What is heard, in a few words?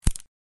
branch break; ion sound